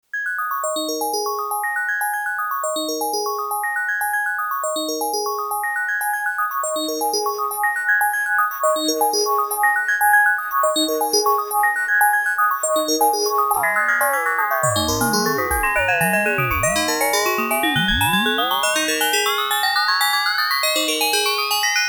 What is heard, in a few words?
Frequency Shifter Chorus; Behringer Bode Frequency Shifter 1630